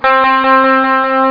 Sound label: Guitar Reverb; Instruments; Sound Library 2000 - Wayzata Technology (1995)